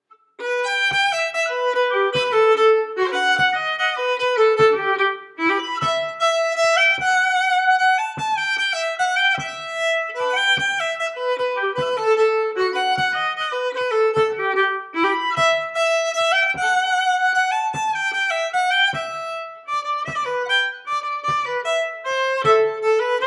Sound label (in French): Valse